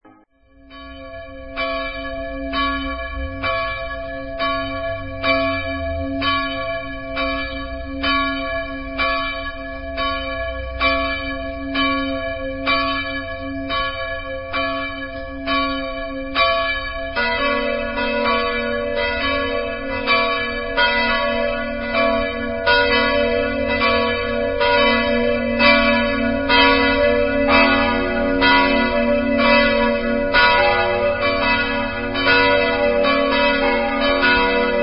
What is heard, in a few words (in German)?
Glockengelaeut